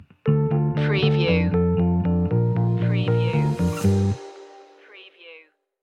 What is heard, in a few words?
stinger